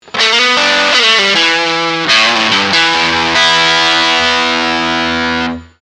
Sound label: Ceramic Dist Low Position